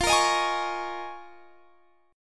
Eb 7th Suspended 2nd Suspended 4th F

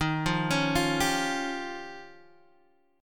F 7th Suspended 2nd Sharp 5th

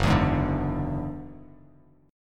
Gb 7th Suspended 2nd Sharp 5th, Bass Standard; strummed; JGuitar

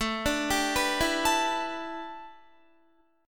A 7th Suspended 2nd Suspended 4th Guitar; A7sus2sus4 arpeggiated

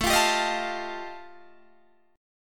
G 7th Suspended 2nd Sharp 5th A chord

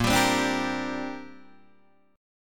A sharp 6th Add 9th, Guitar Open D

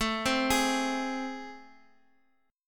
A Minor Major 7th A, Guitar, Standard; AmM7 arpeggiated; x,x,x,2,1,4

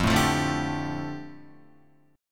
F 6th Add 9th F down Guitar, chord

